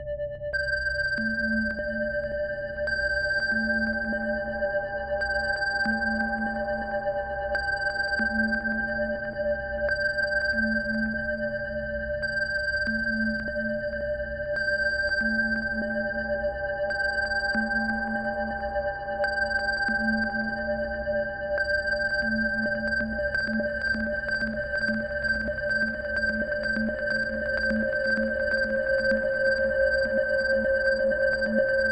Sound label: Atmosphere geiger; sumo blanco